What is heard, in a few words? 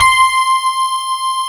PF C 5 -L, GRAND PF; AKAI S-Series CD-ROM Sound Library VOL-7; D.GRAND PF